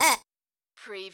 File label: CARTOON SILLY HORN HONK